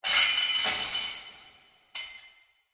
horror chain shackles drop dungeon prison reverberant